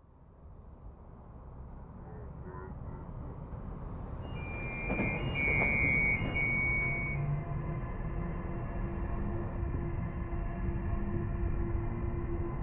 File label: big city beep